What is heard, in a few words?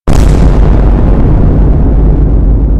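vine boom bass boosted reverb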